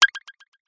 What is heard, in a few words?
multimedia game sound digital fast collect item